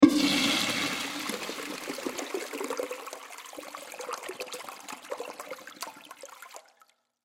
Toilet Urinal Flush, Foley Toilet Urinal Flush In Public Restroom; Foley Toilet Urinal Flush In Public Restroom Bathroom; Blastwave